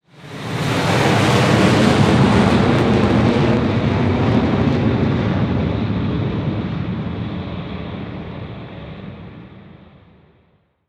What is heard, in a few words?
fly